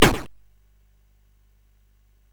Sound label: Dark Castle, CSG8580R5